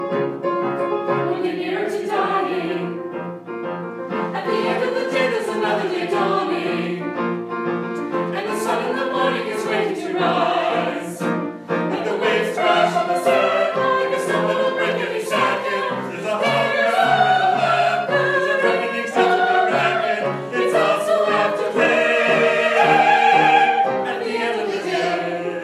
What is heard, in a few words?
Singing